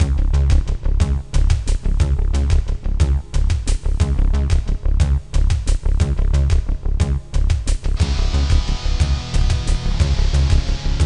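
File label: Ship Combat, game music; alien earth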